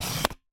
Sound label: Camera Single